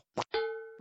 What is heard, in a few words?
hillbilly spit